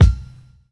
Fluffy Kick Drum One Shot B Key, Royality free kick drum one shot tuned to the B note.